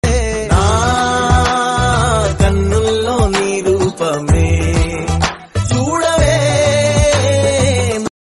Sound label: telugu ringtone; writer padmabhushan